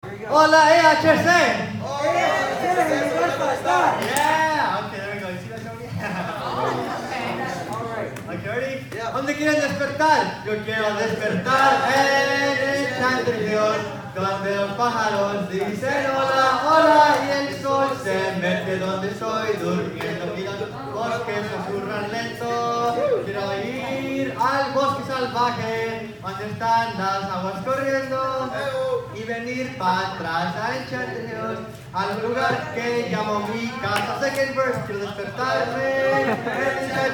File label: song in spanish